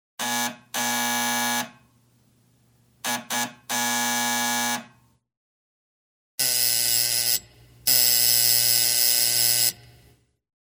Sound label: Door buzzer; SOUND EFFECTS1